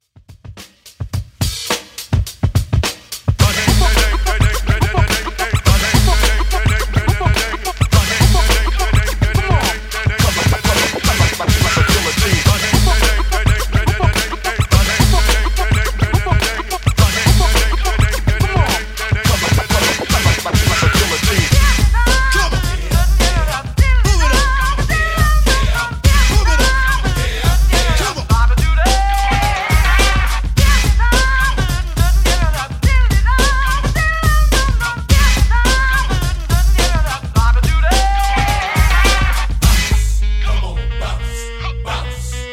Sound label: Funk Scratch Dj Intro Outro 106Bpm